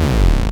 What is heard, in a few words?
technosyn2, Techno Trance; Ueberschall; SYNTH